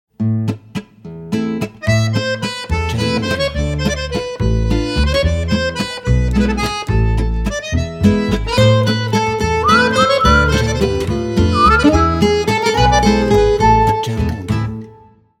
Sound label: French Intro Logo